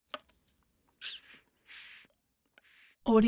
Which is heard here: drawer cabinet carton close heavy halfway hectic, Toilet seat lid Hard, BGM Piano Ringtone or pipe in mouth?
pipe in mouth